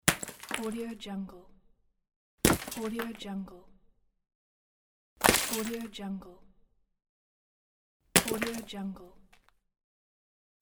ice break; tibasfx

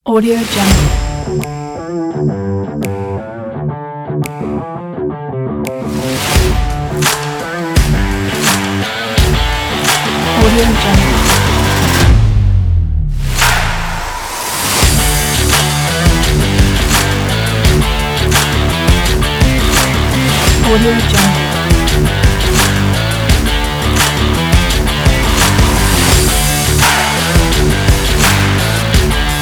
uplifting catchy rock

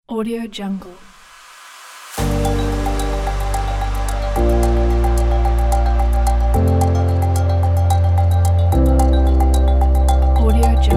digital technology

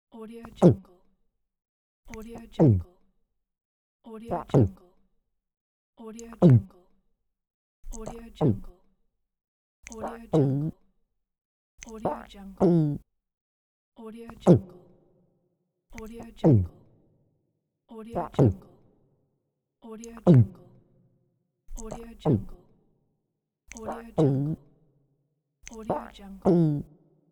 gulp

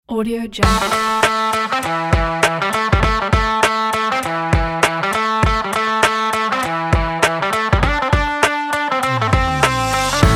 hip hop rock

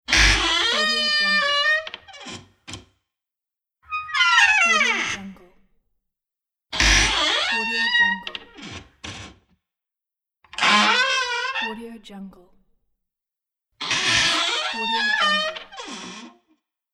creaky old door

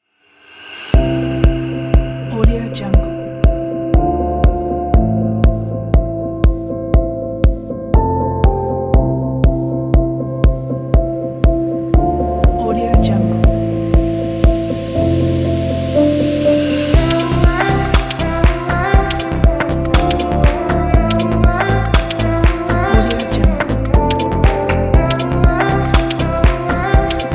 futuristic technology uplifting electronic

